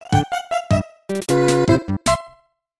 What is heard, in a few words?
Game Over; Kirra Kirra Kizzu